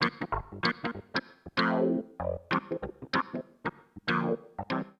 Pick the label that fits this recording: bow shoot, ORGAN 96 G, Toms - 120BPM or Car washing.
ORGAN 96 G